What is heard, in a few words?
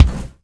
BD, Bassdrums, Roland TD-20